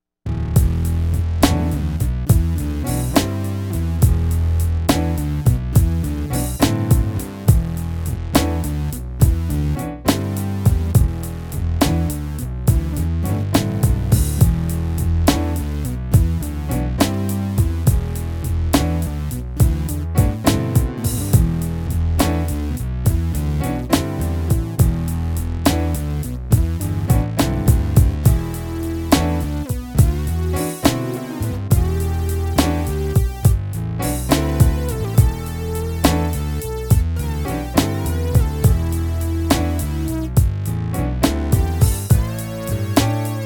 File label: Obnoxious Blues